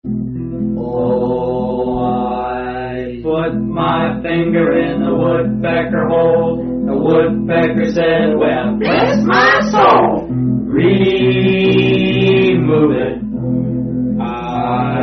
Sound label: The Woodpecker Hole, Variant Tune; Woodpeker's Hole